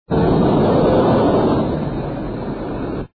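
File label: Jet Pack Descend Suction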